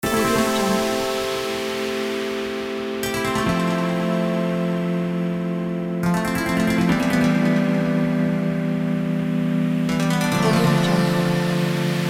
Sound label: fashion chill trap rb